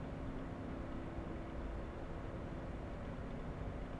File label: air; The Sum of All Fears